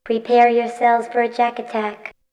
Vocal; ZERO G - Datafile DISC 2

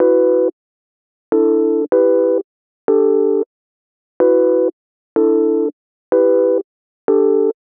125 Rhodes Dom E, Keys, Nu Jazz House; PowerFX - Nu Jazz House

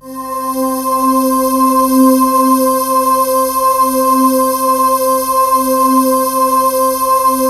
PAD8 C5; Explosive Jungle; USB Soundscan vol.05; AKAI

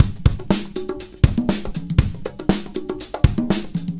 Combo, R&B Drums

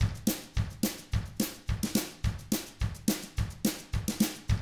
TRAIN, Double Platinum Drums; ILIO; Double Platinum Drums 1

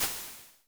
Crash Waves, Vermona Mono15 Drums

